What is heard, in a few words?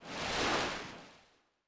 Seasho; Yamaha DTXpress-II